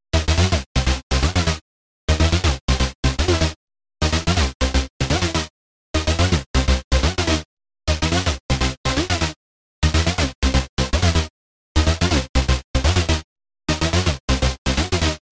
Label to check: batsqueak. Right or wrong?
Wrong